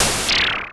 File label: Noise Hit; noise hiss crackle; Noise Hits